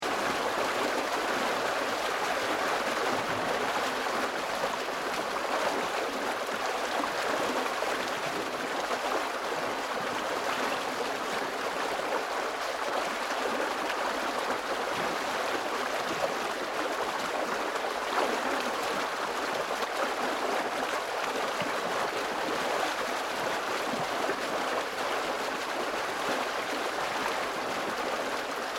Nature Countryside Waterways Weir Canal Water Behind Sluice Gate Strong Fast Flowing; Water Sound Effects